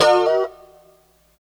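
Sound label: F 4 B mono slide R, guitars; Spectrasonics; Hans Zimmer Guitars VOL-1; 90's Sample CDs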